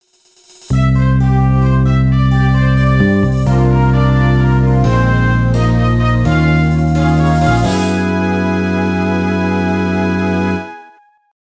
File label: Music Race Intro Fanfare; MKDS